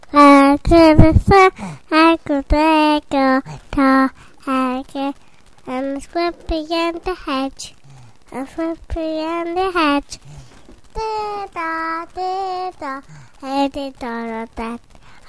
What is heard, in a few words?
Little Girl Singing